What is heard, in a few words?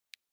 TYPING